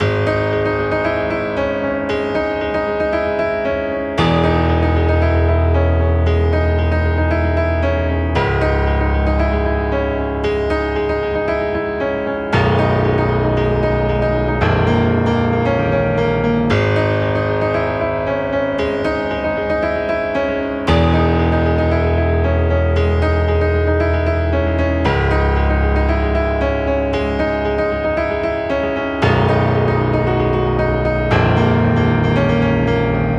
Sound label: cinematic drama real piano 115bpm